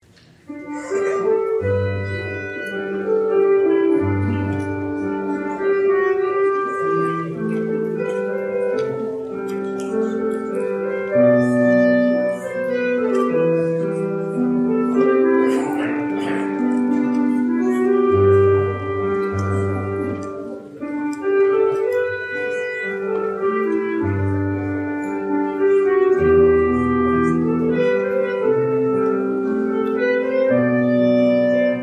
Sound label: preservice, instrumentalists